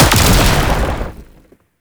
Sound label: Sniper Lightning Strike; Weapons; Warframe; Sentient; Caliban Prime Sniper